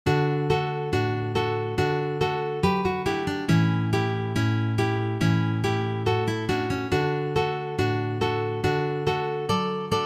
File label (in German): Gitarren; traditional; 3 gitarren